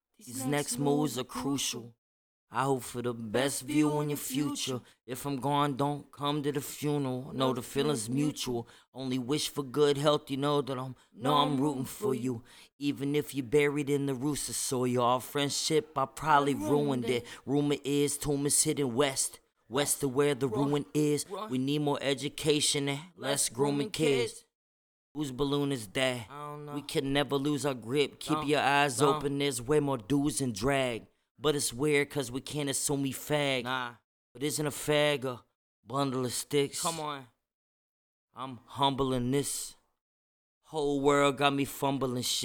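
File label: hip hop 162 D minor, Acapella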